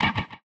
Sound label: Perc, drums; One Shots; Percs; Touch Loops; BlissedOutBeats